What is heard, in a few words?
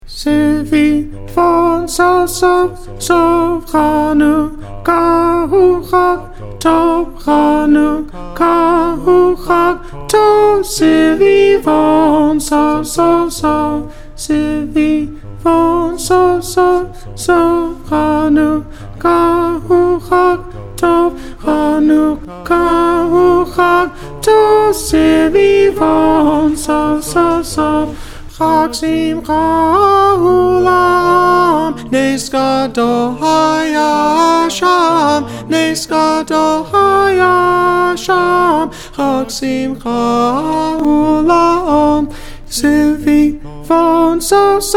alto